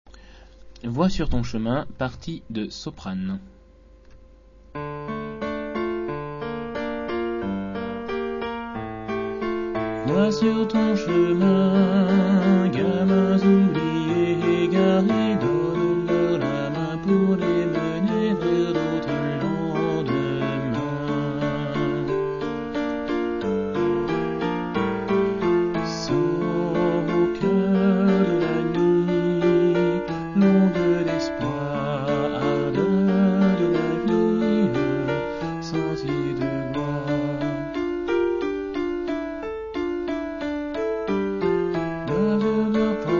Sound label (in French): Sop et tenors